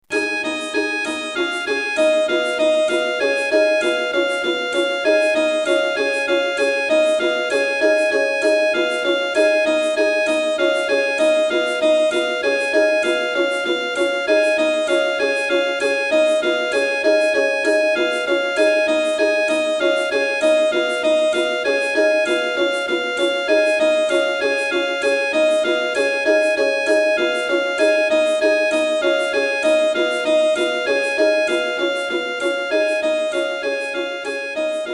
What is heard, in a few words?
Nzakara Harp Songs; Brenner; Vienna 2007